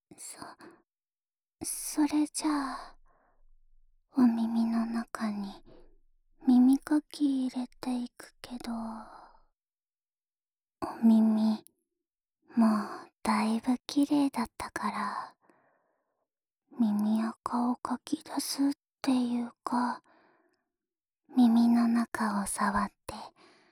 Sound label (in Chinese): 掏耳朵